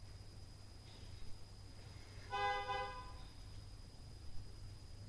Fridge + STREET NOISE KLAXON

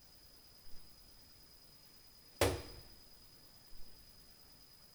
TAC NOISE, Night Noise

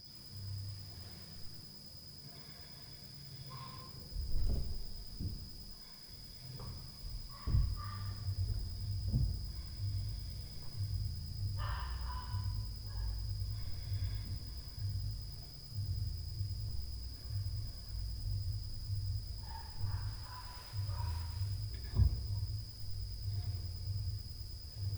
BIG SOUND + DOG, Night Noise

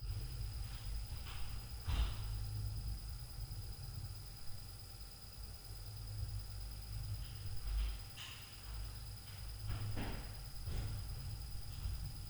FLOOR + DOOR NOISE, Night Noise

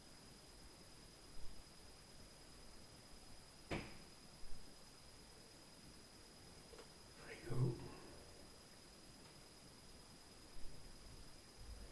Fridge NOISE + Comment, Night Noise; POC

